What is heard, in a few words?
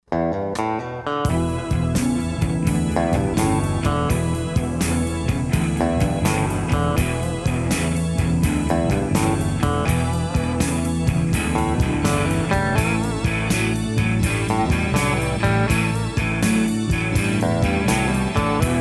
Triplet Blues; Grade One Expanded Internet Freebies